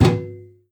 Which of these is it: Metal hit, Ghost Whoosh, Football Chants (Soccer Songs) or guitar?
Metal hit